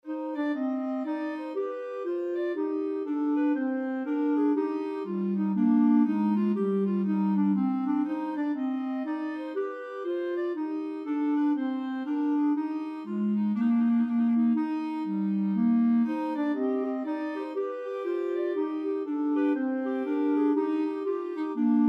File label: clarinet trio